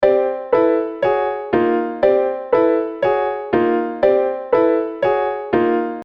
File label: Fifths Tone Row three toget